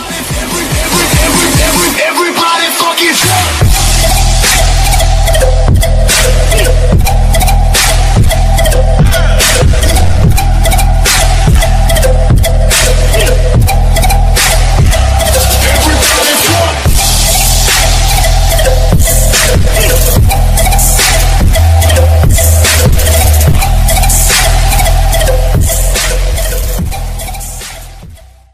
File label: bgm music ringtone